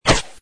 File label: bullet create; croods